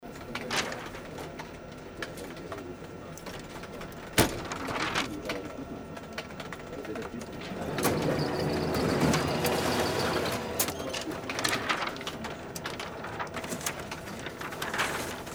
copy machine

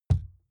wood impact hollow large soft

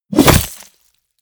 flesh axe two-handed hit

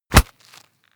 punch low smack hit

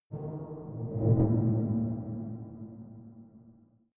ringing impacts

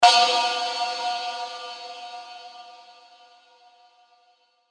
ambient metallic ambient impact

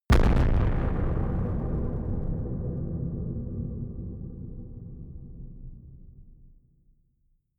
distorted explosions stereo

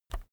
keyboard external key release soft

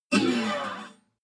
bottleneck strings slide down noise

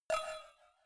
cowbell clank muted soft

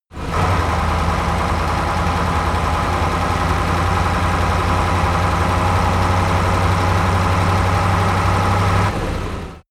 truck ural 4320 driving steady

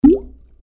water drop

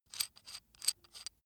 metal screw nut sequence short

